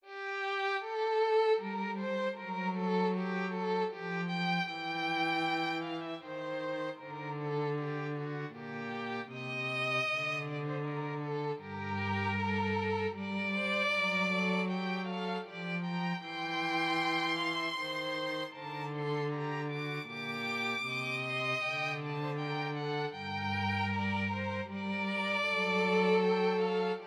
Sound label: canon